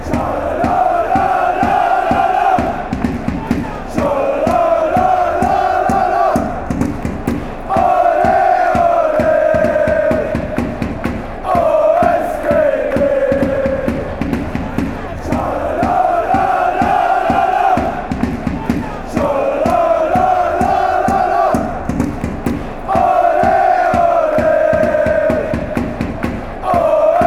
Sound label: ole ole oh, fanchants; sgd